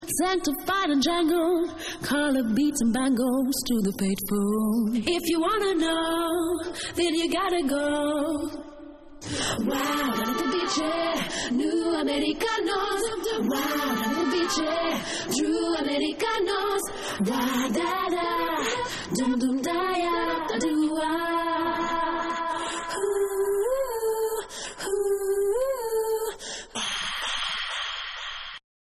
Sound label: Acapella